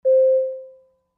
Aircraft Bell Announ, warning signals

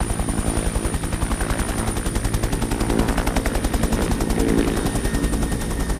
huey idle, UH-1N Huey

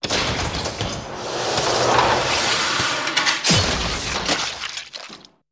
Guillotine Sequence

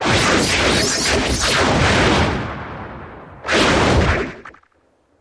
Blue Coil Continuou, arcs & sparks

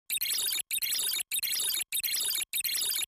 Computer Read Out Data

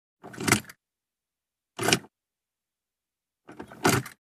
Keys Into Out Ignition

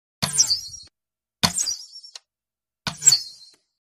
Punctured Long bolt, bow & arrow

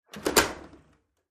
door glass close

